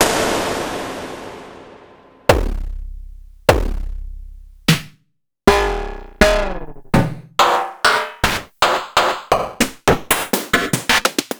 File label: Snare c